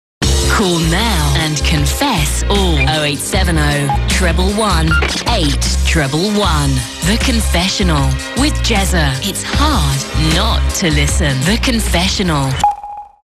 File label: Jingle; Jezzas Confessions